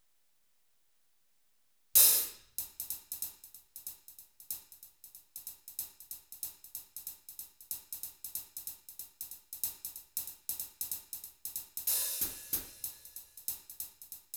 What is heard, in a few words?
hihat